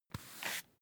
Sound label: broom hand sweep dustpan